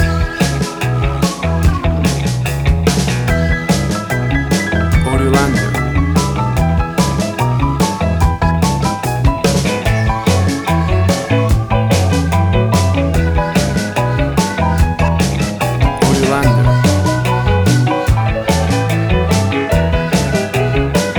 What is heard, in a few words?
50s Pinup